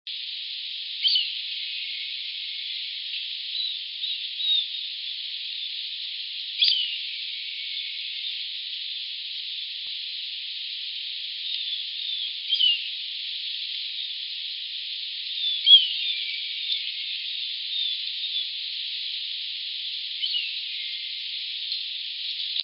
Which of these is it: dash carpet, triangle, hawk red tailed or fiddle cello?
hawk red tailed